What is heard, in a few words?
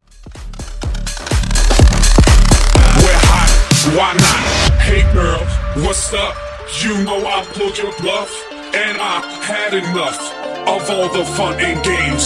Funk MashUp Bootleg 125Bpm